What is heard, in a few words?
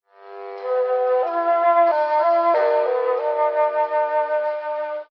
flute, Loops, Sad